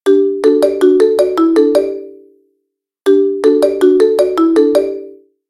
Harmony; Coolpad; Tiptop-N2M

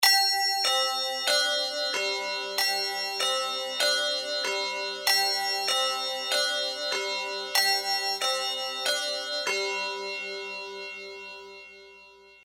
Bell; TP-LINK; Neffos C5

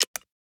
Unlock; Tecno; Spark Go 2022

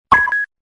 Shutter Sound; KM570 Cookie Gig; Event sounds; LG

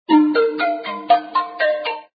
Ringtone; BQ Russia BQM 1402 Lyon

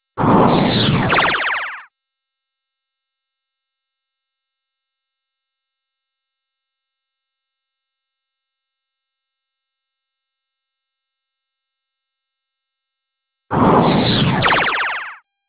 cannon; Pantech PN-215

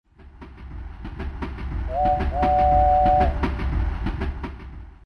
Train

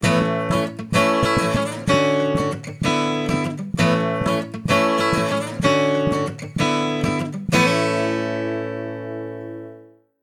Guitar, ringtones; Motorola; Edge 70 Max China

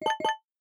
biometric register error; Rollable LM R910N; ui; LG

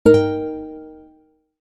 Guitar, notifications; TCL L10

